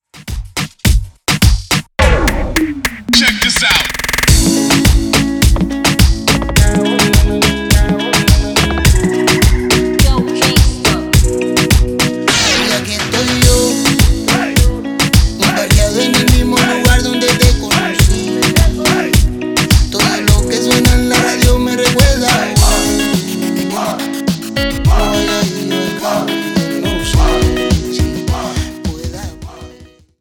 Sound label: Remix Intro 105 Bpm